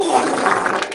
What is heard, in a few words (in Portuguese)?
peido molhado